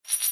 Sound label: rotate; wbg games stable; risk coin